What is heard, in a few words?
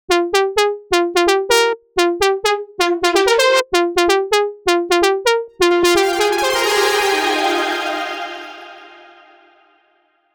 128 BPM F Min Lead; House; Drop Loops; Cymatics; Theater